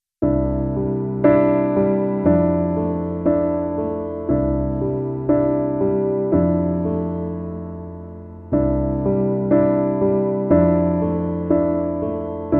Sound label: Piano Only